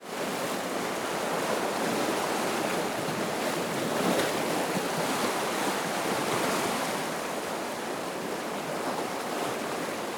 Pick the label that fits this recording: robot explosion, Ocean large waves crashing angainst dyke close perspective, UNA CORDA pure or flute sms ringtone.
Ocean large waves crashing angainst dyke close perspective